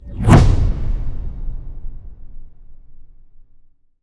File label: Swell To Impact Flanged Swell; bottlerocket